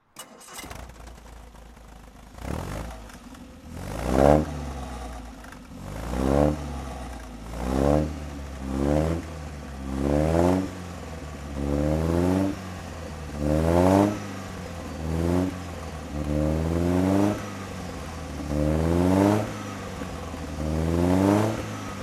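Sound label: Alvis TA 14 special throttle, Engine sound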